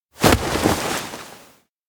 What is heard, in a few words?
grab; chiharu; anemoi パッケージ版